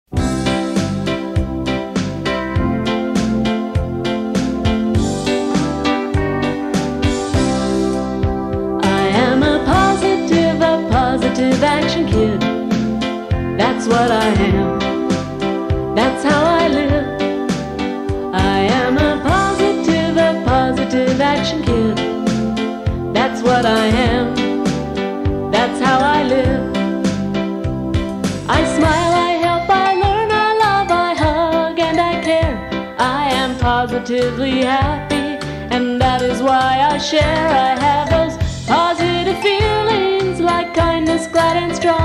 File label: positive action theme song